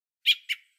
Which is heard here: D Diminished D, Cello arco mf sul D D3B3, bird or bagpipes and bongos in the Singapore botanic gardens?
bird